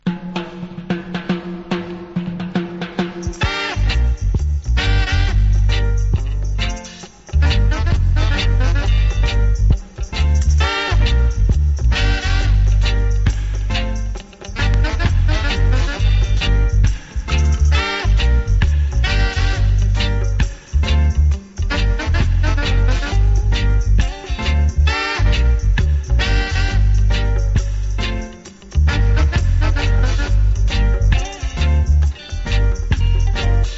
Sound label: Roots Dub